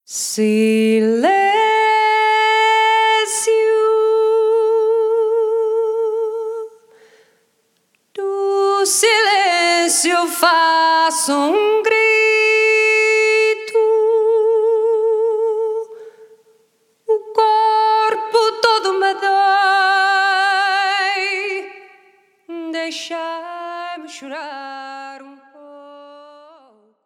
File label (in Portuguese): Grito